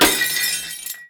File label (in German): Glas klirren